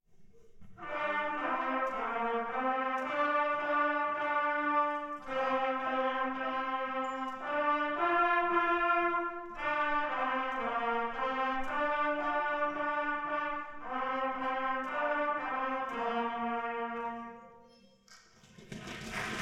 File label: Trumpets; Merrily We Roll Along